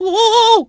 slipping; Mario; Super Mario Sunshine

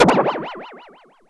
Mega Goomba

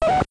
boss hit; super mario bros 2 nes

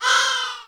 Crow

